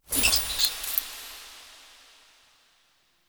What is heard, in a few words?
Squirrel Mote; Animal Motes